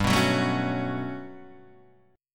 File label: G Major Sharp 11th G down, chord; strummed; Standard; JGuitar